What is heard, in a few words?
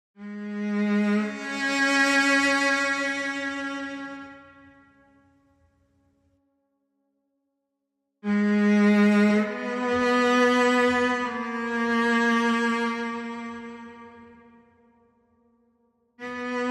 Solo Cello